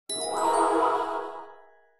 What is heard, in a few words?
heal; Owlboy; Otus